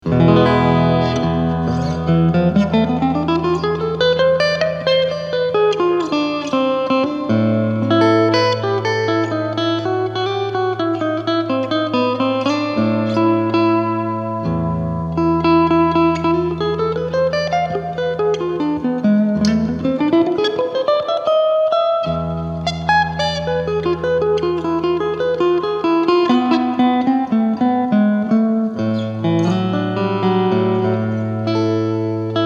Fishman Loudbox Mini

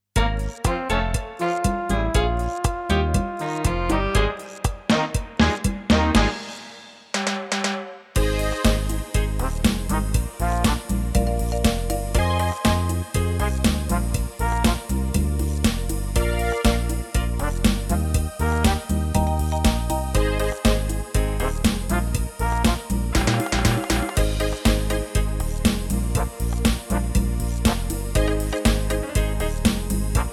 DP95 Style

